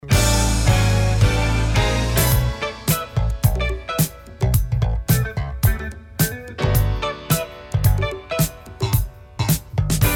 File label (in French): Accord